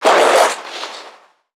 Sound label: NPC Creatures Vocalisations Infected